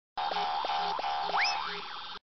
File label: Space sounds